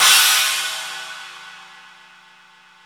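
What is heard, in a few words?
Paiste Signature 16 China, cymbals; InVision Interactive; Lightware VOL-1; Instruments & Percussions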